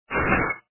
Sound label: air